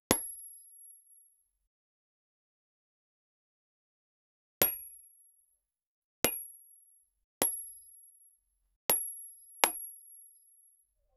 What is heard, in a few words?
the metallic sound of a bullet casing hitting the ground